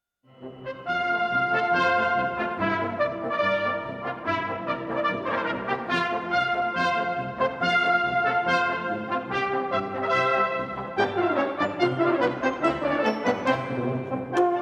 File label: brass band